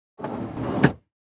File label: Airplane interior bathroom door open; vehicle